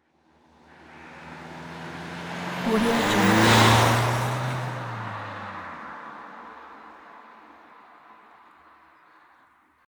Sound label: car passby